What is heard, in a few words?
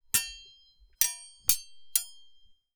Metal; TOOLKIT vol.2